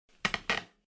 Creak Floorboard; Phasmophobia

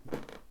Stairs footsteps; Phasmophobia

